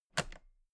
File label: toolbox latches close regular